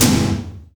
tom; Best Service Gigapack 2

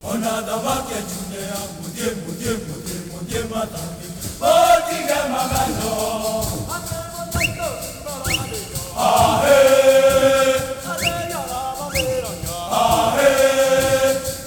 Heart of Africa; Spectrasonics; Heart of Africa 2

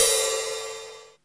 EXOTIC, CYMBALS; CYMBALS 1

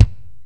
BD, Dance Mega Drums, HIP

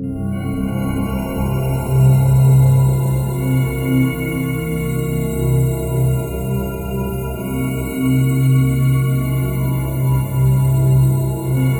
HUGE PAD; Zero-G Ambient 1

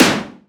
Borg Snare, drum kit; Polyend; Stazma & Concrete Collage; Concrete-Dual Borg

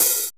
HH HH, Dance

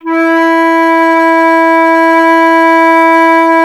flute E; Miroslav Vitous - Solo Instruments VOL-2; Solo lnst. II

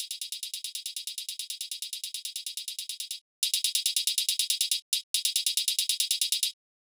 drum Loop 140BPM CL HH; Vengeance - Essential Clubsounds Vol.5; VEC5 Drumloops; VEC5 Multi Loops 140BPM

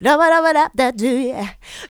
Vocals, Adlibs; Houseworx

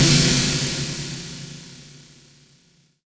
Wall of sound, snares, drum hits; Nu Dance Electro

